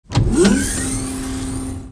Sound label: liftoff; quake2